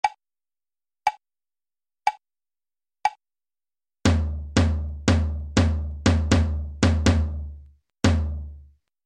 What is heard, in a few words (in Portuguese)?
imitacao ritmica